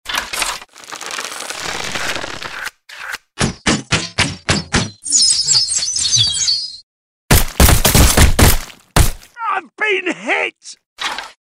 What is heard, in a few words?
soundpack crossbows